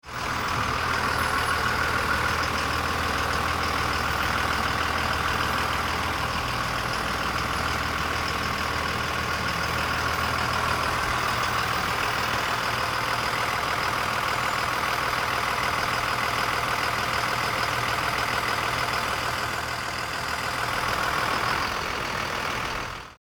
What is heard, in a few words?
delivery truck idle; Panel Truck Idles , C / U Perspective; Trucks; Vehicles